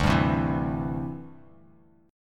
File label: D 9th Suspended 4th, chord; strummed; JGuitar